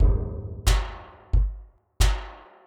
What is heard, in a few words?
junkyard rhythms, drums